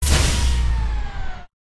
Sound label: silverback motor stop; gow